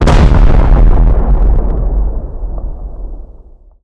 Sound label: rocket explosion; undead toilet